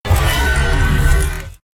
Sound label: Pitched up Alien roar